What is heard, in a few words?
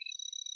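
screen scroll, computer; federation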